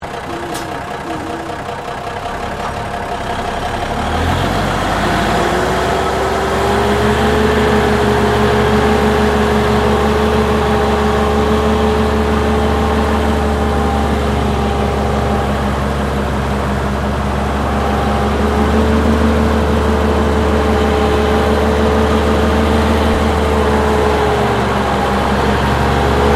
Truck Military M816 Wrecker Manouver Slow, Tank Transport vehicle